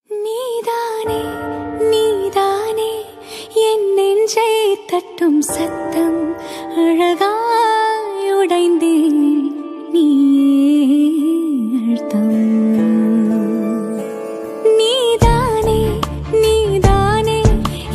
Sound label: Tamil Movie Song Ringtone; Mersal